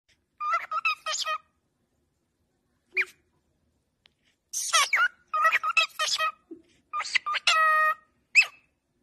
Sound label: Cockatiel; صدای حرف زدن عروس هلندی قربونت بشم